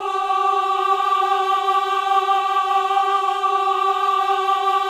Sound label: VIB G4, VIBRATO, Voices; Spectrasonics